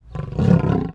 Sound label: ambient